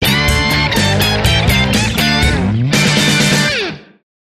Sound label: Mighty Fine Funk, Sting